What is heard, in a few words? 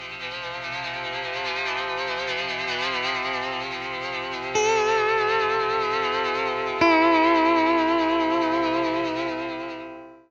LAP NOISE, Guitar & Bass; Quantum Leap